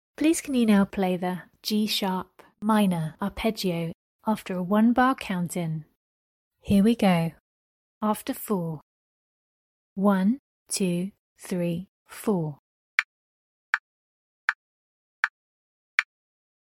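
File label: G sharp minor arpeggio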